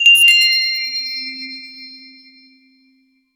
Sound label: magical glass chime soft; healing